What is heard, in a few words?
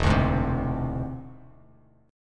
E Augmented 7th E down Bass Standard; E+7 strummed; JGuitar